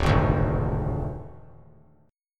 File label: D Diminished D; strummed